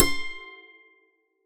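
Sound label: dulcimer C6, Tuned Percussion; Polyend; Simulacrum Sounds; 1 Shots; Acoustic and Electric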